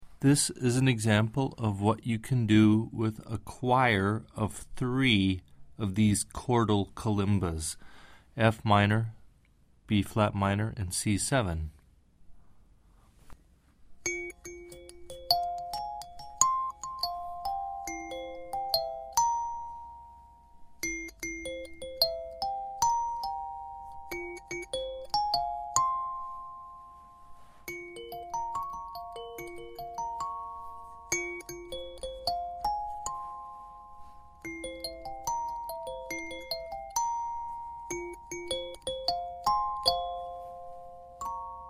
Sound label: 5 Note Choir, kalimba; Hokema